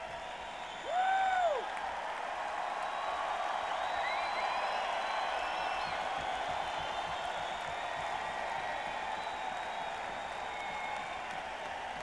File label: Crowd; Madison, WI; Dane County Coliseum